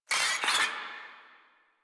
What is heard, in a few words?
Infested Ashen Vocal Pain; Warframe